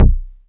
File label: MINI PLK C1, MINIMOOG; Big Fish Audio - First Call Vintage Keyboards